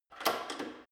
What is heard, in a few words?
gym bicycle saddle adjust lock; sport freizeit; fitnessstudio